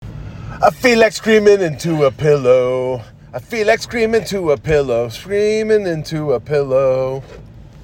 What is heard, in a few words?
screaming into a pillow